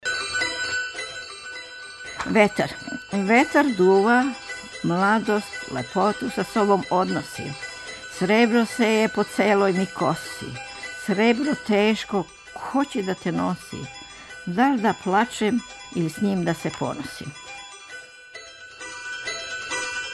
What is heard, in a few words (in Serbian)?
vetar